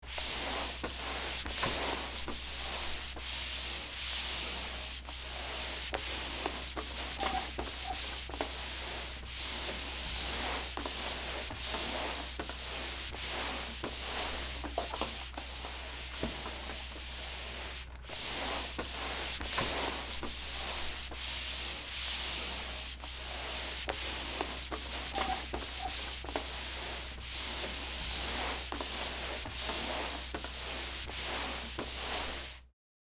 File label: Mopping floor back and forth movement space mop